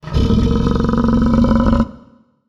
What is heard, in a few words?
lion